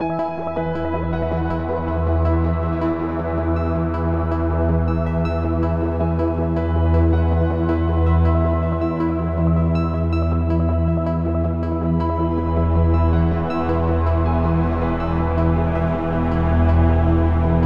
Ambient Joy; NWNDS

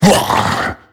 grunt hit, creature; GammaAge

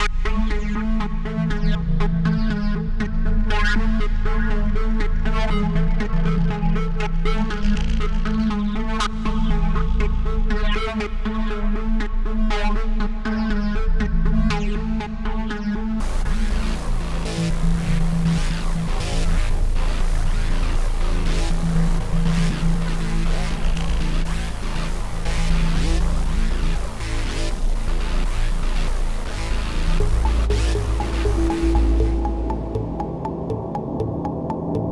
Ambient Fight Intensity, Music; NWNDS; Ambient music pack

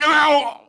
hit; NWNDS; montaro

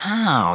hit; NWNDS; Morte

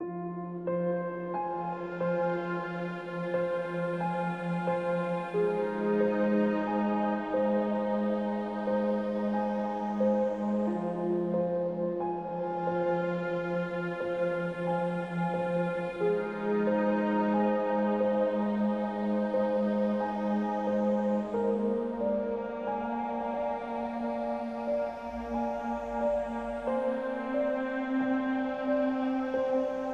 Ambient Sadness; NWNDS

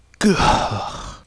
hit; NWNDS; Scarab

